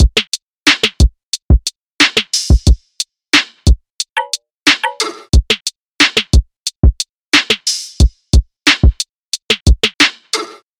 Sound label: Key 90bpm